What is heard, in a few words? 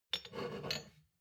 shot glasses pull out slide soft; berufe; soundpool